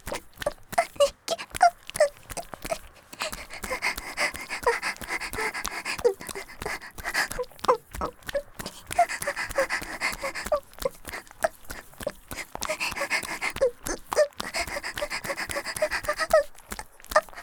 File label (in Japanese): 木枯らし ギシアンループ; あ～にき！いくら出す?; 木枯らしバージョン